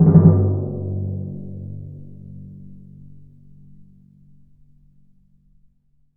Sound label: TMP, Synclavier Percussion